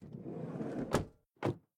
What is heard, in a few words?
Minivan; LOOPERS